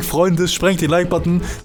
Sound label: death; spackenmobs; apored